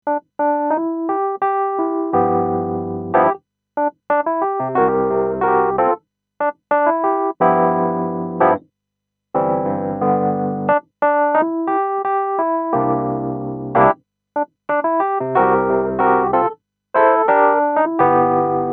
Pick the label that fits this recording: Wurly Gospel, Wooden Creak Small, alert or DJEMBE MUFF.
Wurly Gospel